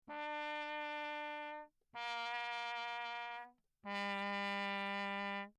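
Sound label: trumpet mute Lechner low; Perinétventiltrompete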